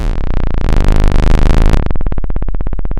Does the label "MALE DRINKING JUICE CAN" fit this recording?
No